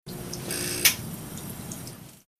HOSPITAL EQUIPMENT DENTIST: Dental x - ray machine exposure.